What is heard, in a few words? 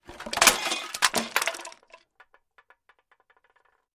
Junk Debris Spill